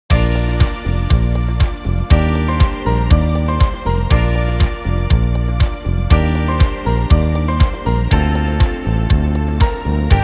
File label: Air Beat